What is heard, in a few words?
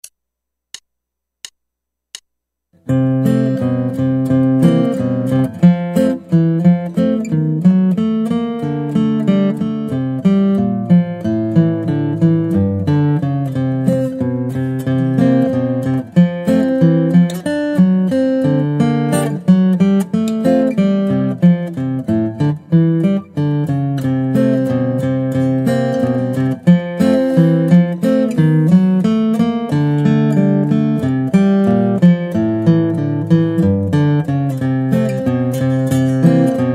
Guitar